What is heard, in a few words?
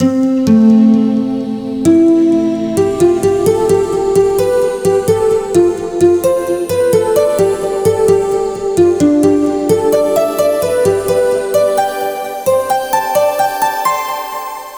Harp Solo